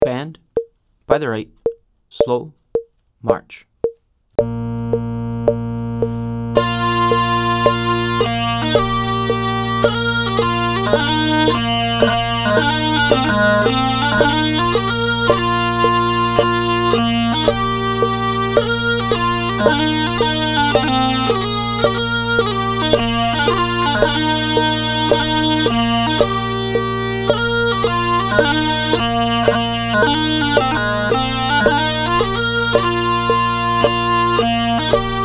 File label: pipe section, 55 bpm; Road to the Isles